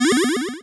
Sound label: Cry